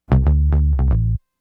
Disco Bass, 70s Analog Funk; Sonic Foundry (Sony Creative Software); What it Is! 70s Analog Funk

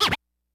HITS BITS; Kid Nepro - Elite

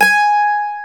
MANDOLIN

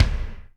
Kik Industry, Drum Machines Alesis D4

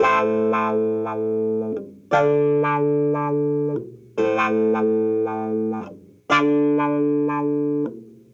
Cruisin' Disco Clavinet, 70s Analog Funk

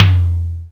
tom mid; Korg; DDD-5

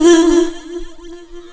HOUHOU VOX, ADLIB WOM, Funky Vocals; USB Soundscan vol.18

